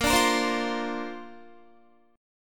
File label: Bb Major Add 9th, Guitar; 18,17,20,17,17,19; 4E,4A,5D,5G,5C,6F; strummed